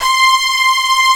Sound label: MIDI STR, SYNTH STRING; Greytsounds VOL-1